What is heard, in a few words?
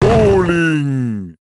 bowling; bouliste